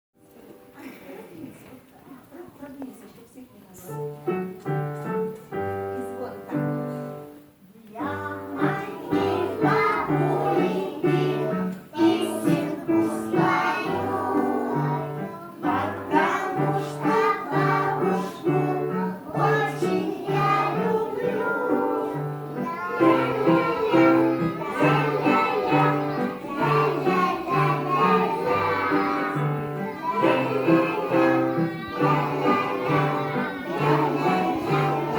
babushka pesnya

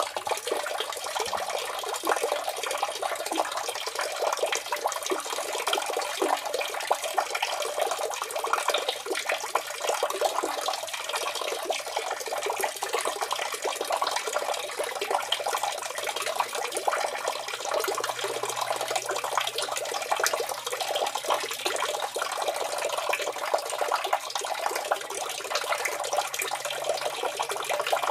zvuki zvuk l'yushcheisya vody; den neptuna; zvuki dlya det skogo prazdnika

